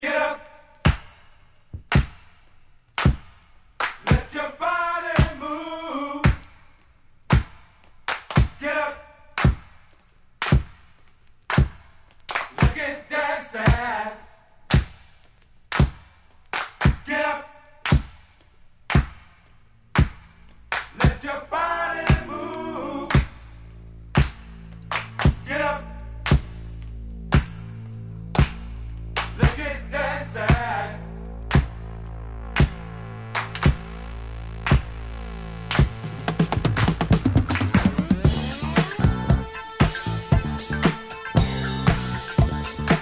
DISCO

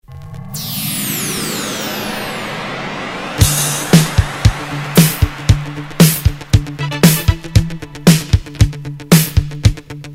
DISCO

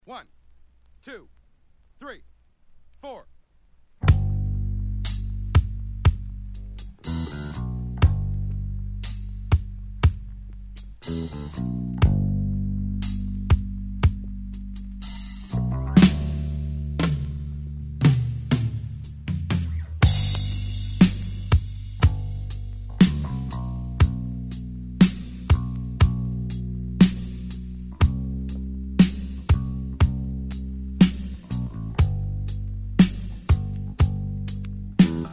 Drum Drops Bass, Slow Rock Ballad

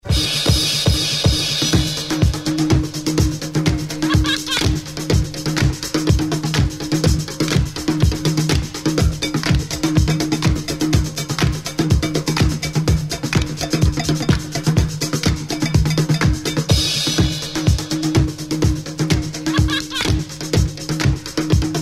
Drum Band

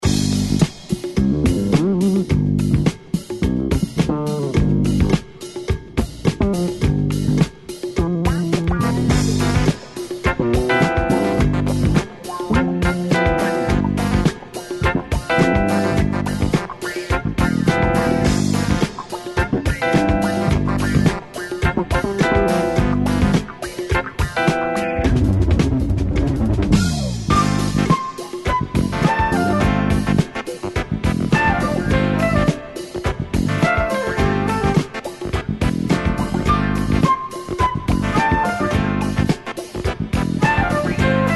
Funk

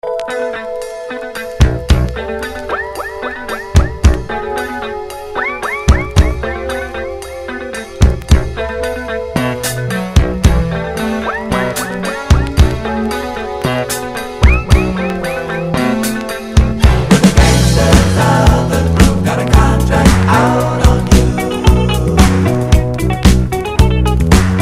Disco

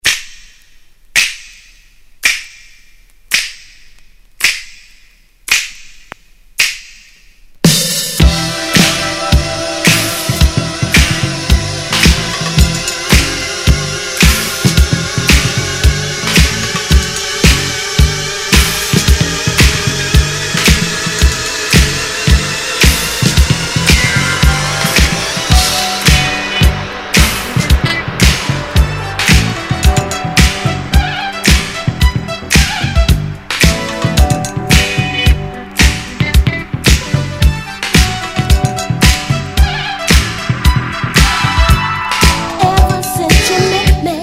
Disco

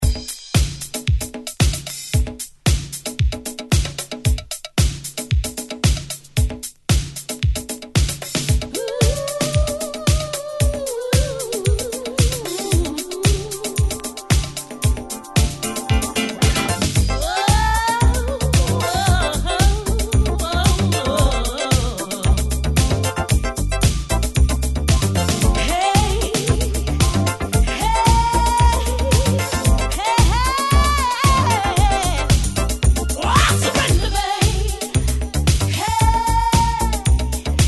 Disco

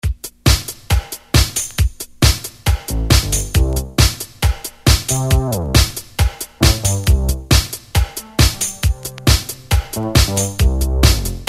Disco

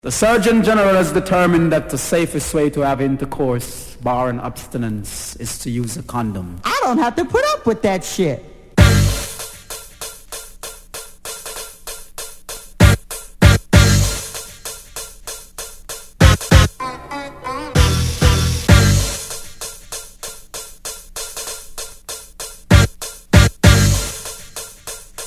Ragga Beats

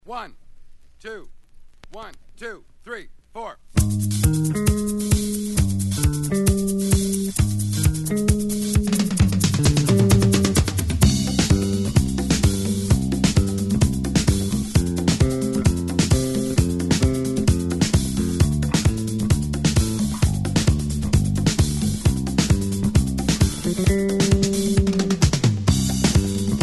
Drum Drops Bass Fast Latin Fusion

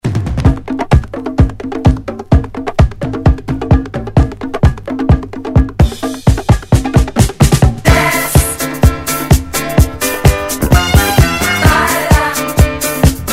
Disco

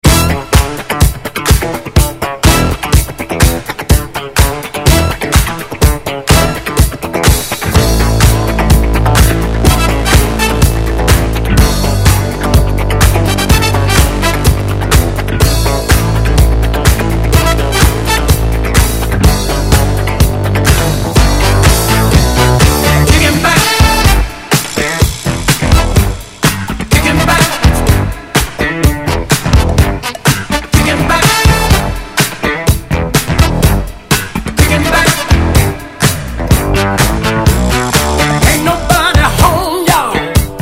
Disco

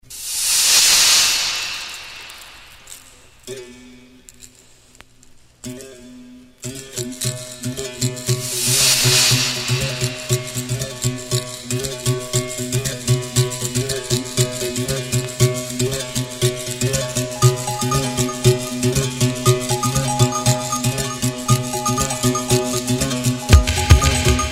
DISCO Percussion Jam

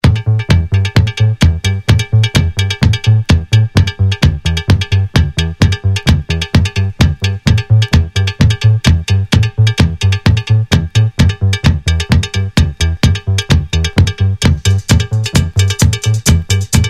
Drum Band; DISCO

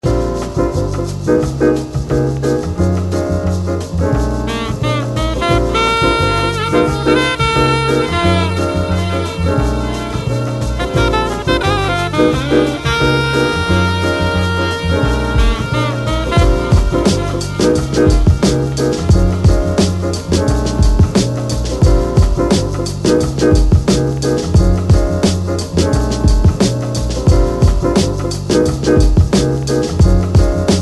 Instrumental

